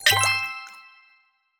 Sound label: Upgrade; BulletHellTemplate; Bullet Hell SDK